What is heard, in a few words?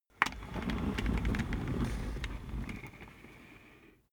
office chair worn trundle long